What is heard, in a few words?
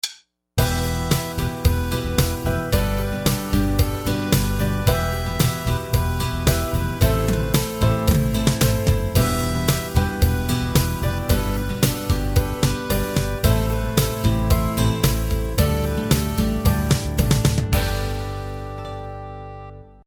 Style Harmonica